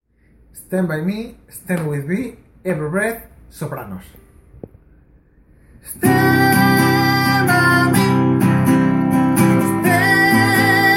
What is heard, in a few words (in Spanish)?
voz soprano